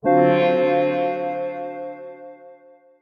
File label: down; Monster girl quest Part1,2,3; Visual Novels